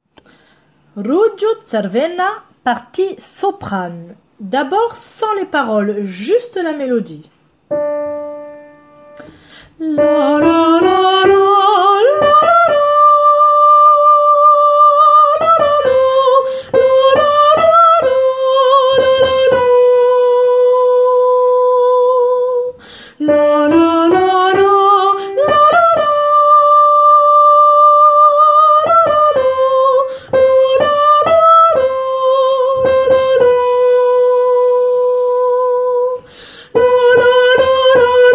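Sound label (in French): soprano sans paroles; Ružo crvena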